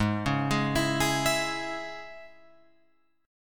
Ab Suspended 4th Sharp 5th, Guitar Open F; Absus4#5 arpeggiated; JGuitar

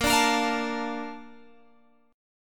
A sharp Major 7th, Guitar Open G

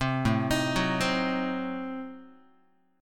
A Diminished 7th A down Guitar; Adim7 arpeggiated; JGuitar

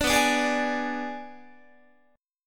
B Minor 6th B, Guitar; Bm6; strummed

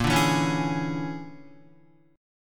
A sharp 7th Sharp 9th Flat 5th, down, Guitar; strummed; chord; JGuitar

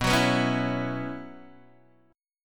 B Suspended 2nd Suspended 4th, Guitar, Open G

